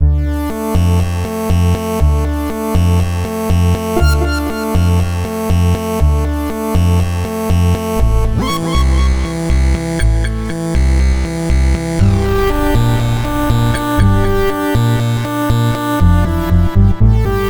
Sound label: Eerie Mausoleum Loop